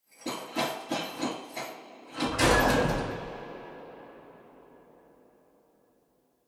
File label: curious